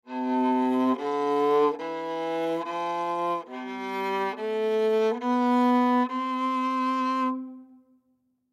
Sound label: Viola Scale Hold, Viola Scale Hold End Child Practice; Strings; Blastwave